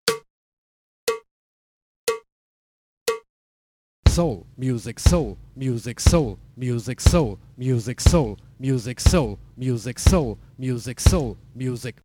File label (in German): Uebung, schlagzeug